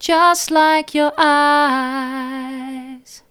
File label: mid, vocal